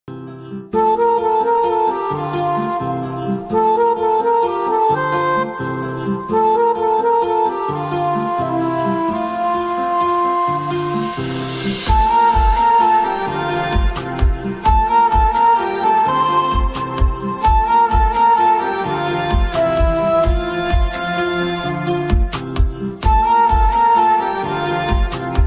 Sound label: emotional ringtone love background music; south emotional ringtone south love background music; love tamil bgm